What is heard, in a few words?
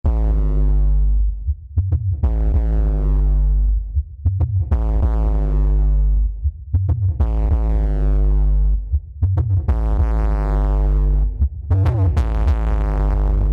electronica